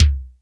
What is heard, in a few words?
FAT KICK; Big Fish Audio; Synth City